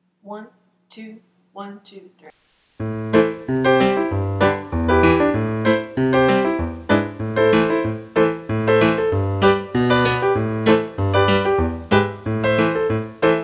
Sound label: Piano At Tempo; Muilean Dubh